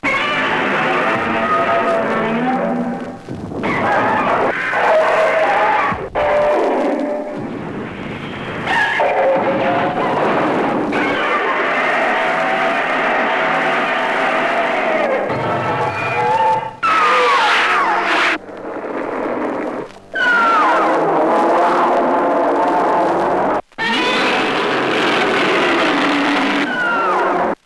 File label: godzilla; Animals